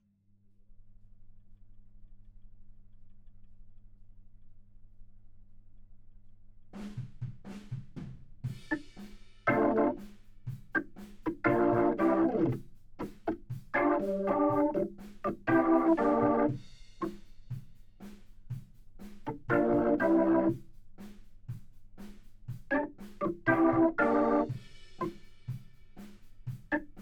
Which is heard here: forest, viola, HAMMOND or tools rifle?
HAMMOND